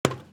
Footstep Metal; Footsteps

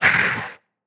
attack; Barotrauma; Crawler

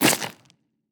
Gore; Damage

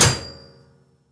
Metal Impact Light

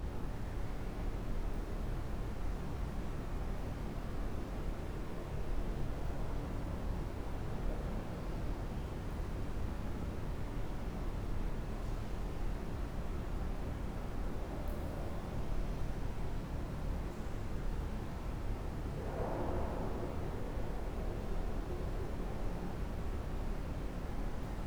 Biome Noise Loop - Cold Caverns; Music

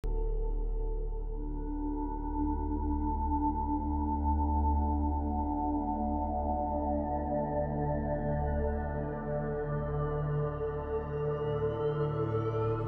Arriving at Destination, Music

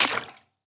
Footstep Water; Footsteps

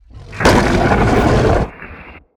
SPINELING GIANT idle

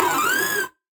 DEFENCEBOT damage, Defensebot

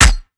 damage guardian pod impact; Alien

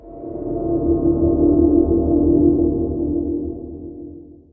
Ambient

